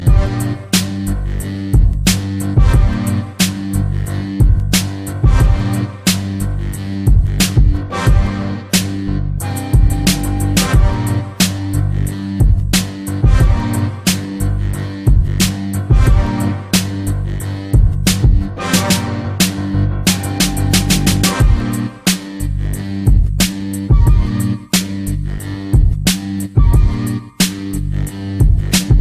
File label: gangsta beat; hip hop